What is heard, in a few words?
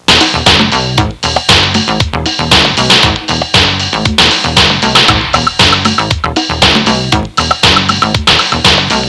modern rock beat; Wayzata Technology (1995); Music Intros